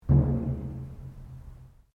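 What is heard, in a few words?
Drum Taiko Odaiko piano, Large Japanese Taiko Drum; Miscellaneous Musical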